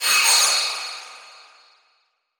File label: Transition Whoosh Short; Production Element